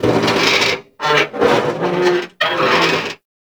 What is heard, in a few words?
Asteroid Machine Creaks; Warframe; Grineer Asteroid